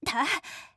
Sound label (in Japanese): 攻撃